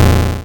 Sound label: Arcade Riffs